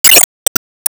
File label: Enemy Wood Poisonball Bomb; Flare; gamerip